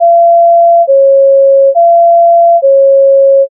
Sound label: ambulance